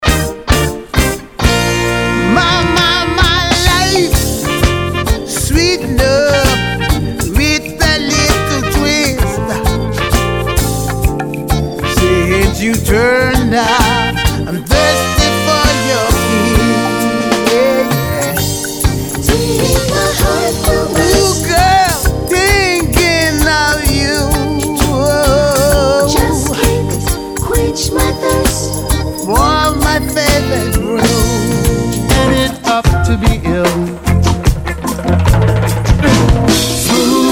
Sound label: Reggae Bounce